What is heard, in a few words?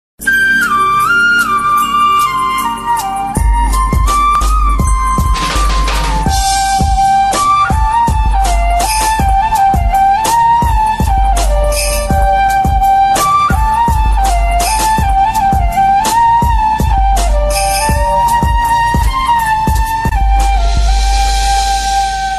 airtel flute ringtone; airtel original ringtone